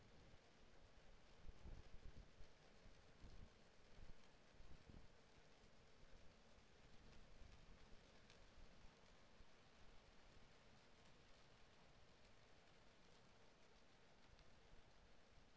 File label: HYDROPHONE 16000Hz; JASCO AMAR HYDROPHONE 740